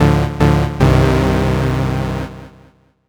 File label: dun dun dunnn